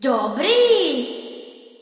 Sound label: shout small; Fish Fillets